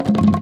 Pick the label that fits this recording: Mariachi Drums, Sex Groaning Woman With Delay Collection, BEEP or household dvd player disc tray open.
Mariachi Drums